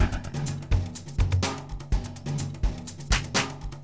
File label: afrobeat 125